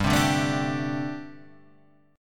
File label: G Suspended 2nd Suspended 4th; Gsus2sus4 Chord {3 3 0 2 3 3} strummed